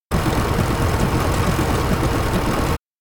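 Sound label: electronic plane engine propeller spin; anemoi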